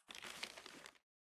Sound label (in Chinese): 写字声